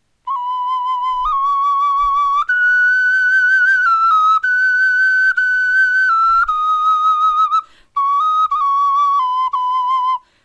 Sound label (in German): melodie